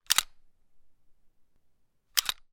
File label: weapon gun walther ppq; still north media